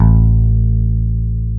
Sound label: P BASS A2, pick bass